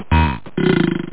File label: people; da capo vol1